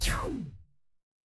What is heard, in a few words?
retro game laser